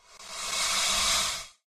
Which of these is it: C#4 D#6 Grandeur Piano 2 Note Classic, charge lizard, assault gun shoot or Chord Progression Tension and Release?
charge lizard